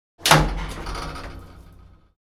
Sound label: Service elevator door slide and latch